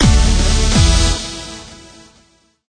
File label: Stinger Friend Complete; Android